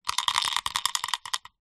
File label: leisure dice shake in plastic shaker